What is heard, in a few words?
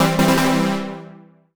Solo Warm Synth Positive; MUSIC EFFECT; startide